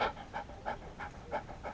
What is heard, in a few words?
pup selection